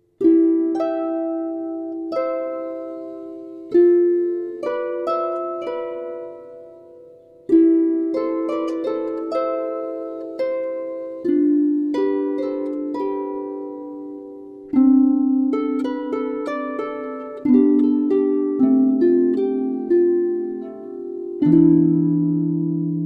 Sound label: Harp; Clair de Lune